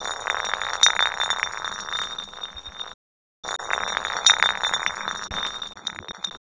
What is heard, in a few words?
Resynth Glass Roll